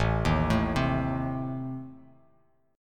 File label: A Diminished Bass Standard; 5,6,7,5; arpeggiated; JGuitar